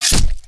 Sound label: KNIFE